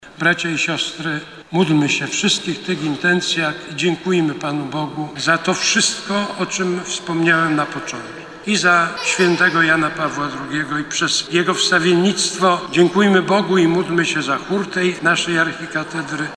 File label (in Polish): chor